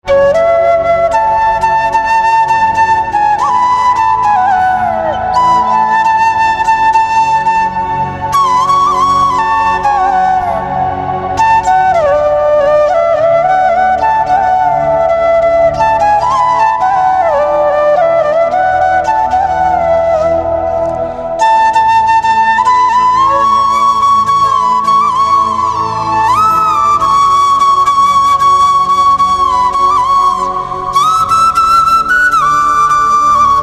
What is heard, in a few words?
Flute Slow, instrumental ringtones